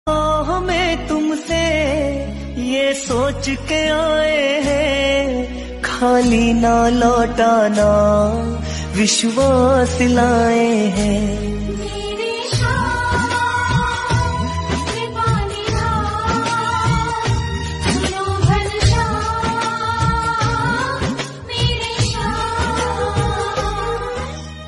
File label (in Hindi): Khatu Shyam ringtone